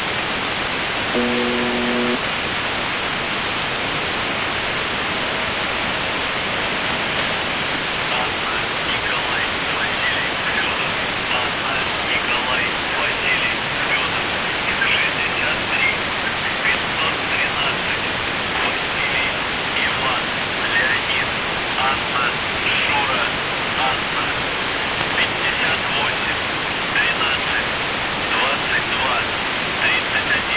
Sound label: buzzer usb msg